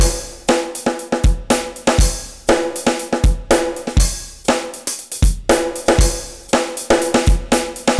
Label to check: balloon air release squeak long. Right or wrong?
Wrong